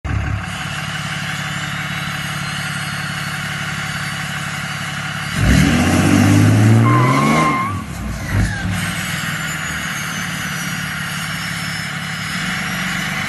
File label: whistle sound, Dodge Ram; custom tunes engineered for Dodge Ram; RaceME